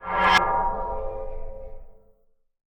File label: reset